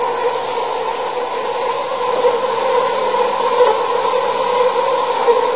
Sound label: mosquito; 暴力赛车